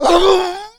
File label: Male Die; Mars Sortie